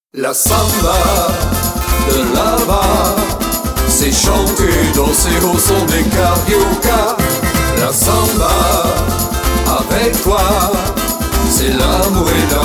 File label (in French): samba version chantee